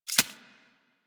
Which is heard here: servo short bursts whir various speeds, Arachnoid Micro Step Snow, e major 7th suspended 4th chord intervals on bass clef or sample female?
Arachnoid Micro Step Snow